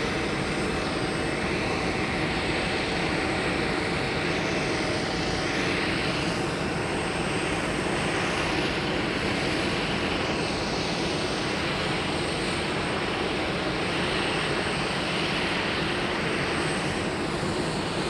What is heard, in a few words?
Heathrow Ambience, airports airplanes; Sound Scapes